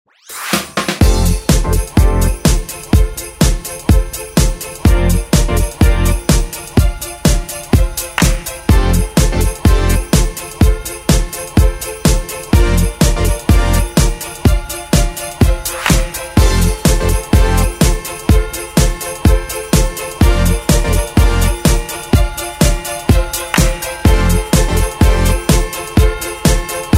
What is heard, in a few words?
sax version; Jingle Bells